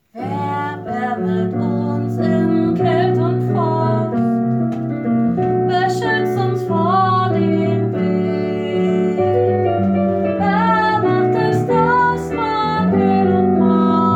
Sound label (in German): Ich singe Dir Sopran